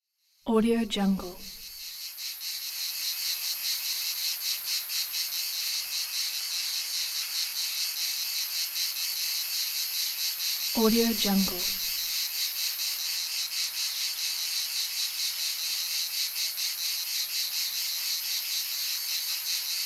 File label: jungle insects; capeaudio